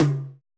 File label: tom, Roland MC-909